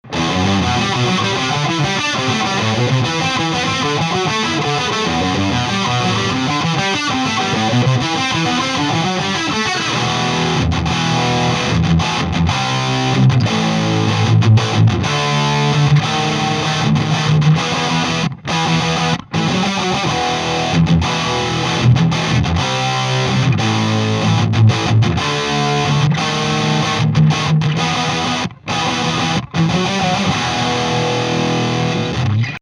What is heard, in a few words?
studio gtrs